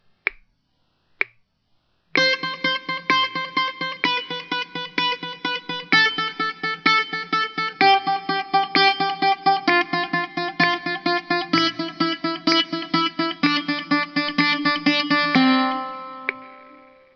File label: BOUZOUKI RECORDED EJERCISES SKILL EXERCI; VANGELIS TRIGAS BOUZOUKI TEACHING METHOD